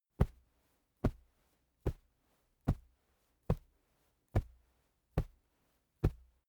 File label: monster footsteps toddle; schritte